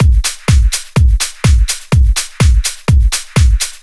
Drum Loops, 125 BPM, Underground UK House & Electro; Sonic Foundry (Sony Creative Software)